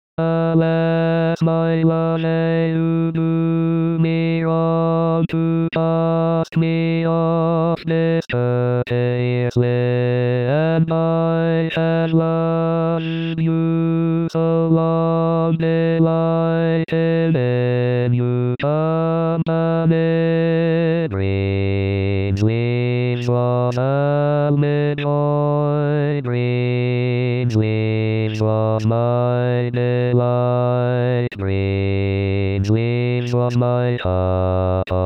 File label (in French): basse; greensleeves; rimailleurs